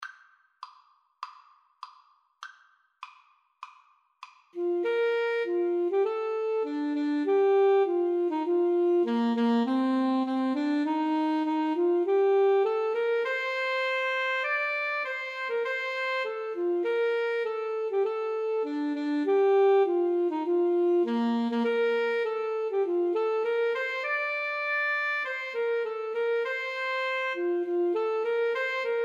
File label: Alto Saxophone Duet